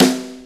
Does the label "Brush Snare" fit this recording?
Yes